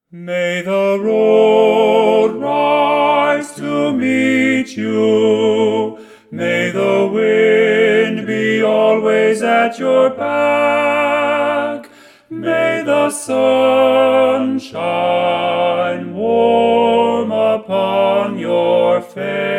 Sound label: Irish Blessing Lead, barbershop